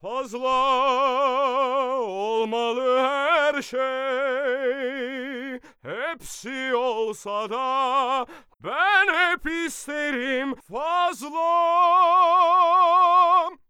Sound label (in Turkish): Şarkı